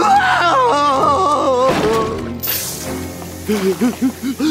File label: baby crying; oddbods zee